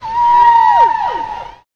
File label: whistle; match